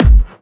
drum Loop 140BPM BD; Vengeance - Essential Clubsounds Vol.5; VEC5 Drumloops; VEC5 Multi Loops 140BPM

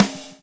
groove; AMG Gota Yashiki Groove Activator

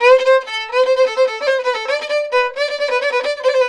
VIOLIN, Balkanish Traditions

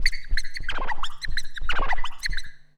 Comic mouse, Scratching; Zero-G Smoke

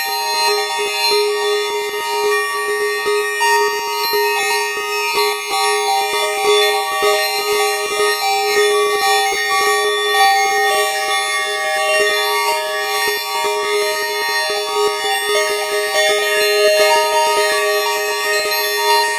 Arp

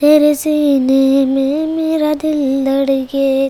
CHILD, Indian Traditions

